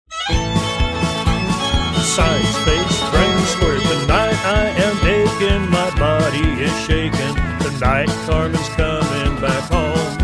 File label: vocal